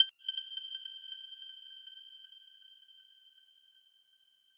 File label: arp